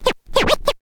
scratch kit, scratches; Masterbits; Vinyl Attack

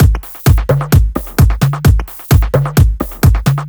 Mono Phunk, Drum Loops, 130 BPM; Sonic Foundry (Sony Creative Software); Underground UK House & Electro

